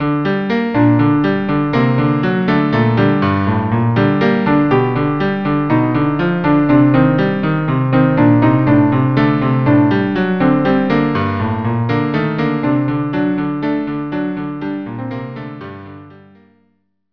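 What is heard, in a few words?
cello cello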